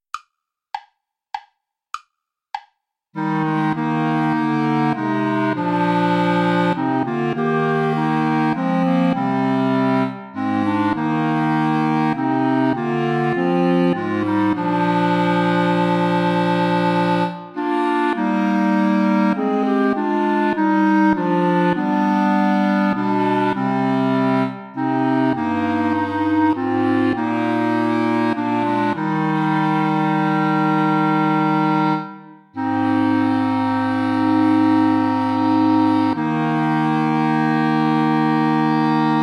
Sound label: play along without clarinet